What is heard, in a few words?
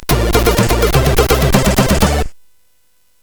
Drum em, CSG8580R5; hvsc